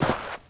sand, player footsteps

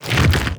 regular, zombie blind, foley; creatures

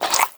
breath out, headcrab

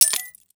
money collect; Counter Strike Model Pack; CS:GO; survival

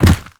step soft, zombie blind; Half Life Alyx; creatures

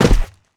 land sand, footsteps; player

